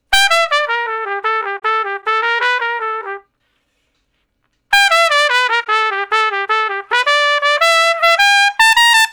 Trumpet Shuffle (E); Solos

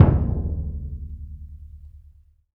AFRO DUNDUN, LRG DRUMS

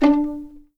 D4 PIZ MF, string; East West; The Ultimate String Collection

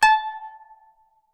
KPES A5 HYBR, KANUN; SoundBytes; Early Patches; KANUN SMAL

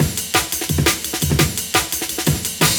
breaks; M8

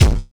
BD, Drum & Bass, 160 BPM

